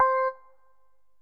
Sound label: vinylead